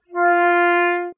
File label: Sax